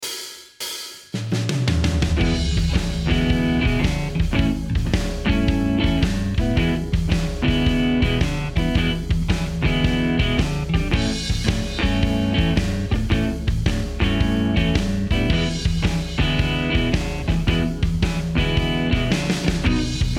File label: 12 bar blues ending on the V in A